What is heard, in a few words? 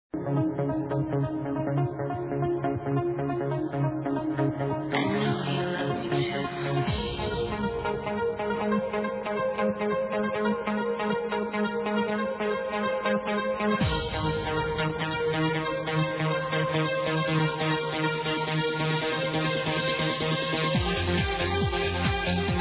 electronic music